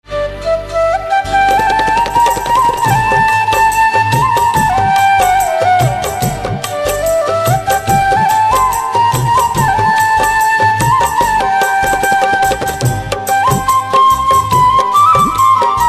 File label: Flute Instrumental Ringtone